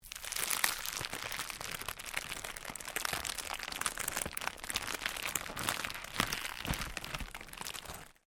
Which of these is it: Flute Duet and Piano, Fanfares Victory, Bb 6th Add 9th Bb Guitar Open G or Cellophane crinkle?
Cellophane crinkle